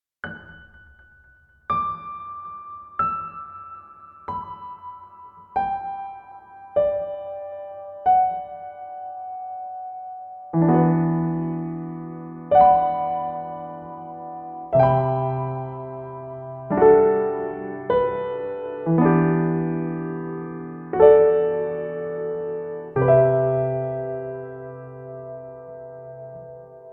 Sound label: piano